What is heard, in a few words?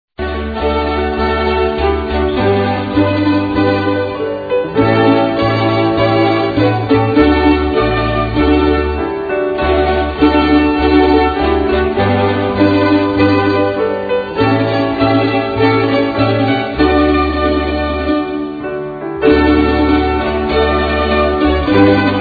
mandoliny